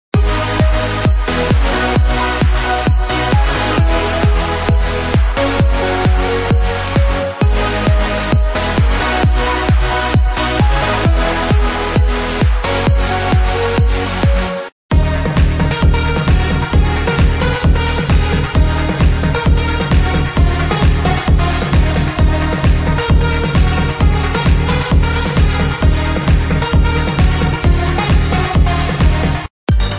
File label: PROGRESSIVE HOUSE CLUB ANTHEMS; SONGSTARTERS